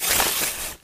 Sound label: spade